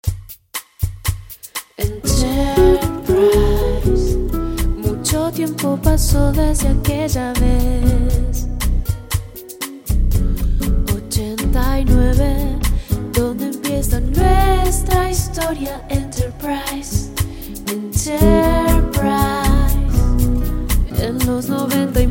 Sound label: Jingle FM; Enterprice FM